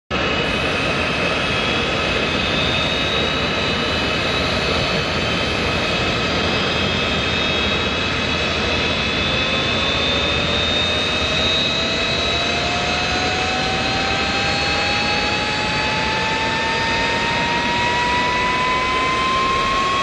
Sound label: MiG-29, جنگنده میگ 29